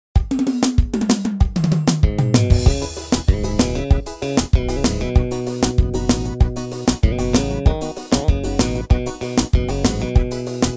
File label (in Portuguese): amostra alemao do forro sem samples